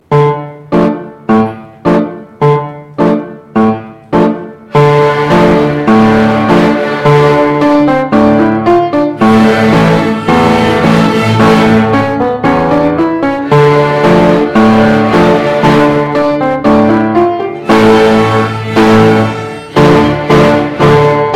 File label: strings hornpipe